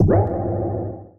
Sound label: goblin hit